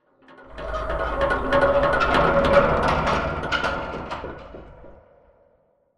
metal stress med; Titanfall 2

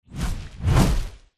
flyer fly close; Titanfall 2

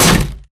bullet heavyballistic titan; Titanfall 2

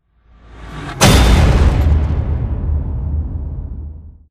tackle start impact; bt; viper; Titanfall 2

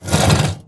Stalker Damaged Crawlscrape Concrete; Titanfall 2

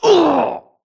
grunt death quick; Titanfall 2

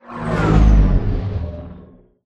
deploy from mega; Titanfall 2

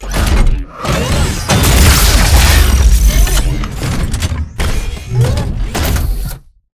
execute flip takedown; bt; Titanfall 2

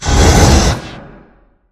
sliding door sm verb close slide; beacon; Titanfall 2

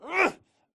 crawl diag grunt; Titanfall 2

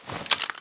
spectre zombie drag grunt; Titanfall 2

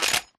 sniper reload bolt forward; krabersniper; Titanfall 2

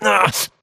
grunt hits; Titanfall 2

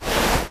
Grunt Mvmt FOL Soft Slow Cloth; Titanfall 2

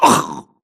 grunt death neck; Titanfall 2

